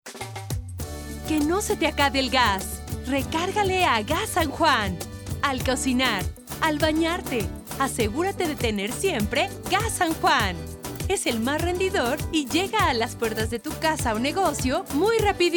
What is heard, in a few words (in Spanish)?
gas jingle; san juan